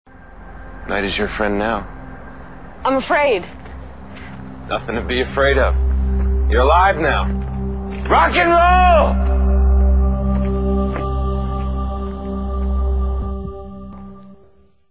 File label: rock n roll